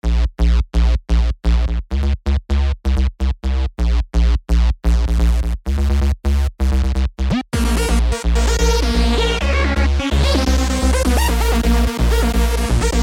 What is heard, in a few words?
SYNTH PUNK; Redshift 6